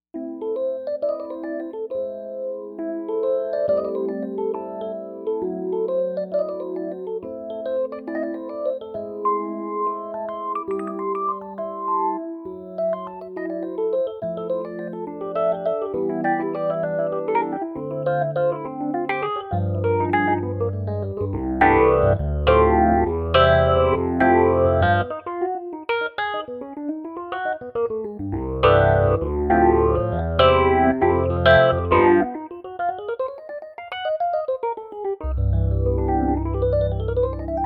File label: suitcase phaser; Jupiter-80